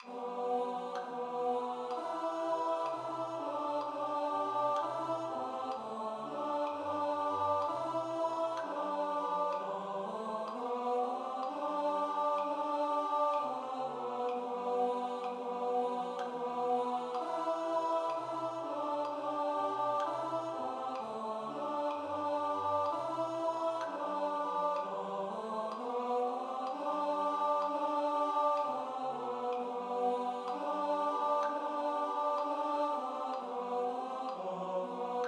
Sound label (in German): Ich steh an deiner Krippen Tenor; Kantorei